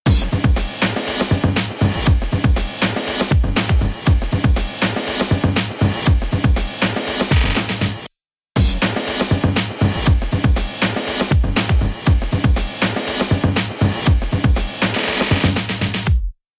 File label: Go Down Beat